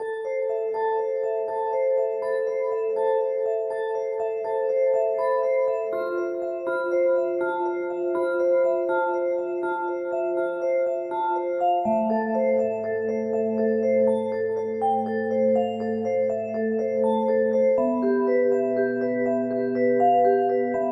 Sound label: like a dream spooky atmosphere